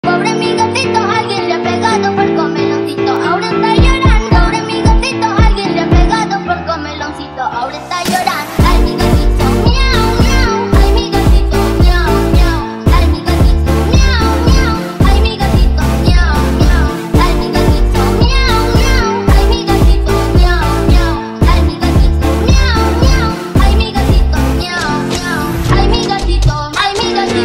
montagem slowed

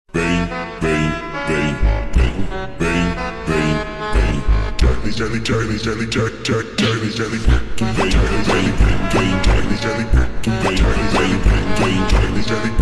funk slowed

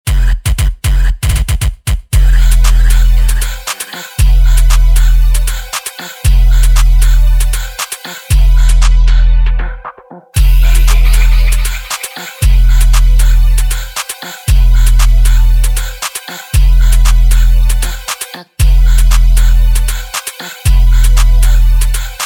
sped up

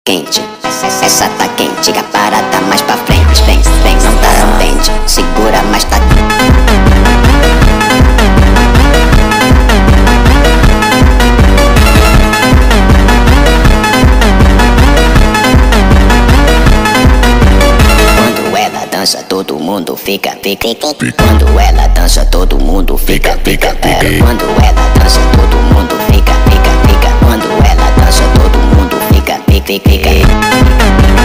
funk sped up

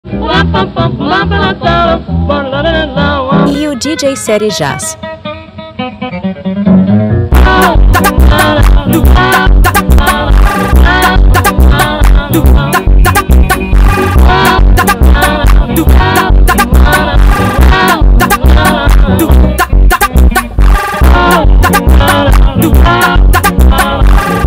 funk

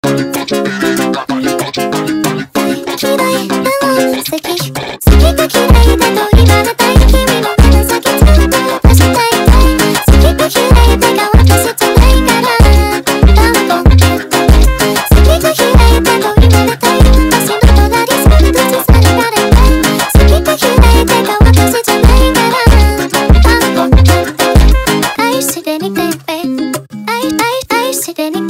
funk sped up

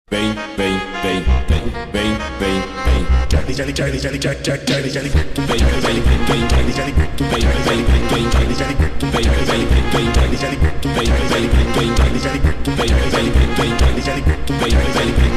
funk sped up

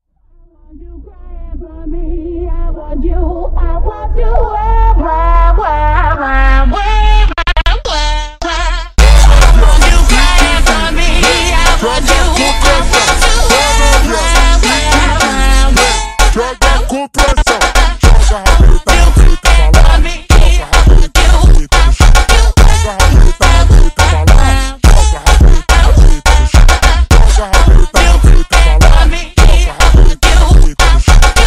funk slowed

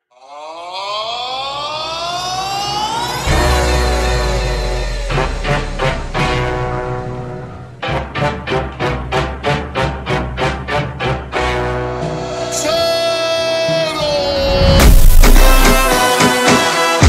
mexican phonk slowed

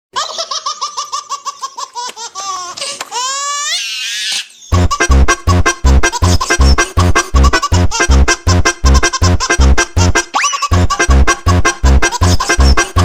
baby laugh jersey funk sped up

